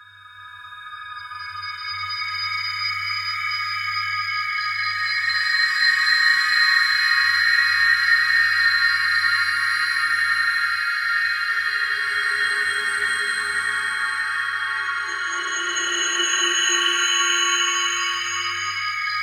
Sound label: PSYCHIC, Ominous Drones; USB Soundscan vol.48; AKAI